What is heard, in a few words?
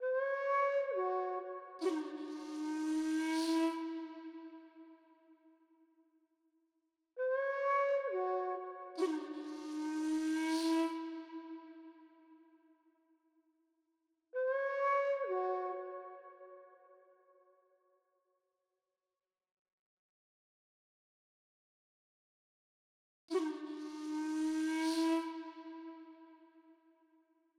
134 BPM C# Min Flute; World; Cymatics; Senses